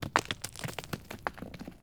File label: rocks Falling